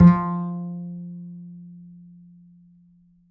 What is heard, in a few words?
mf G, G string upright bass; Upright Acoustic Bass